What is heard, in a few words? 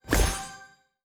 Bonus Blue; Run-and-capture; Sound Pack 01.09